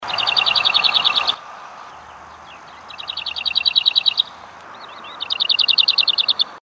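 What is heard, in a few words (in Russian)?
slavka zavirushka, lesser whitethroat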